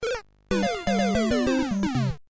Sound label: Mario Dying